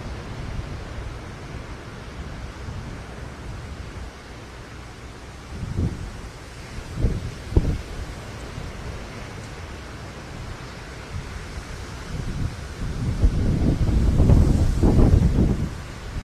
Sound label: Tyrannus tyrannus Eastern Kingbird, Veracruz; Tirano Oriental; Tyran tritri